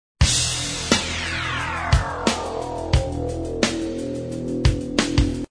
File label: drums, Groove